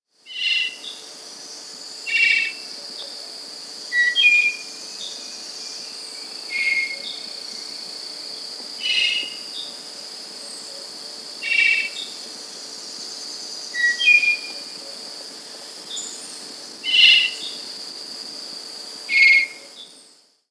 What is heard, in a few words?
Spotted Nightingale-Thrush (Catharus Dryas), Andean Birds; S. America